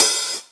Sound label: half open, Drums Percussion; Drum Bass; Zero-G; Total Drum Bass